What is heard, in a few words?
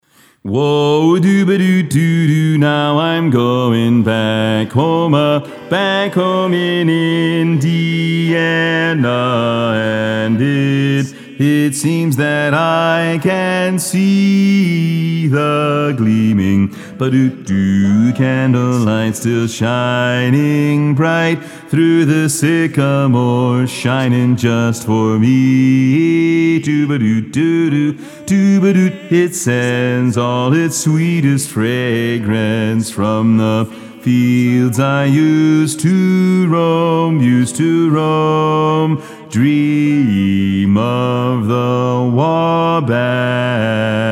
Bass